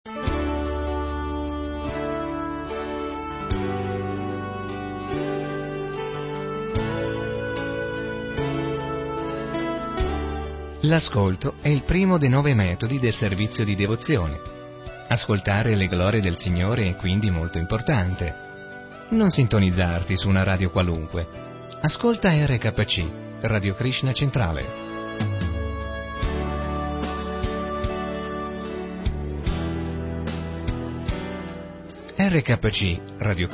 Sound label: Jingles; L'ascolto; RKC; Italiano